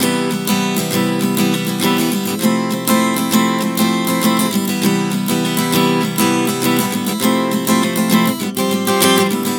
Cmaj7-Fmaj7 100 bpm, acoustic guitar chord progressions